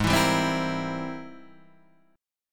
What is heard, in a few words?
Ab Augmented Major 9th Ab Guitar Half Step Down